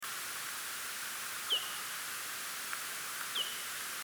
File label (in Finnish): pohjanlepakko; janakkala 2009; Lepakot; laurinmaki